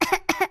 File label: Poison Cough; hedgewars; Default pl